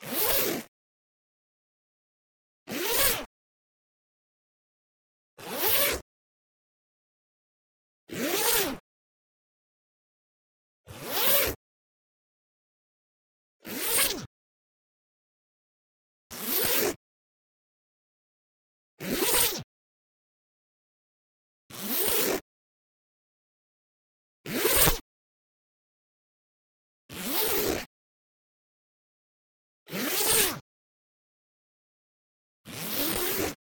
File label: Wool Coat metal zipper - fast zips; Mindful Audio; Elements - Zippers